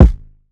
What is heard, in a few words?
Glass Kick; Kicks